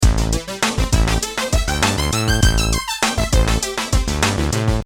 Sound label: arp korg gadget